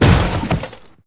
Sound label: DROP